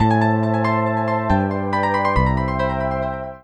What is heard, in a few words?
Rim Fragment Pickup; Shotgun Legend